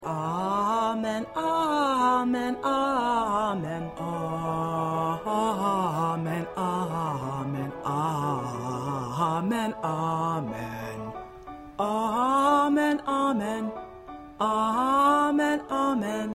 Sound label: Solo Bass